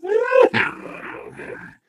ballbot nice

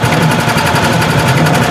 giant demoman loop; source engine; tf; mvm; video games

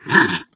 ballbot confusion

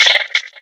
box monster chitter

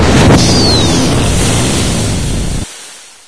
egon off, weapons; source engine; hl1

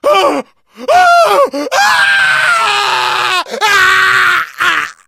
death scream, survivor voice

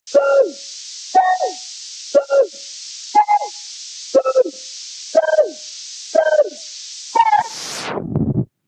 golden ratio; source engine; portal2

